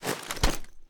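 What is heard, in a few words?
submachine gun first raise end; gmod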